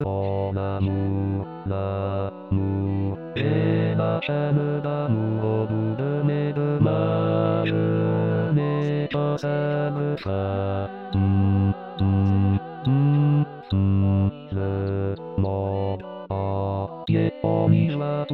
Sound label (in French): basse